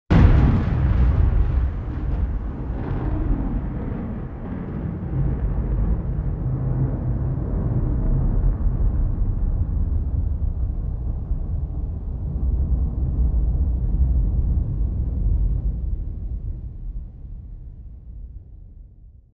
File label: Thunder Roll; Ambience